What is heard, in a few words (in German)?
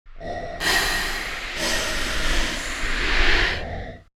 Ei aufschlagen FX